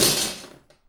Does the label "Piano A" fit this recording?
No